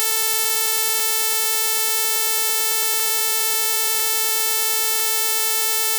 441 flattest waves

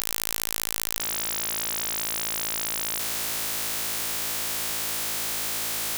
flattest waves full

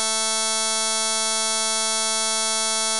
220.5 periodic noise

